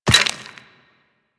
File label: Walk Bone, Footsteps; Warframe; Operator